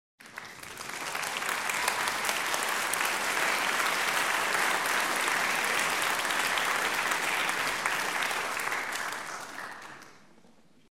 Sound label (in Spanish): aplausos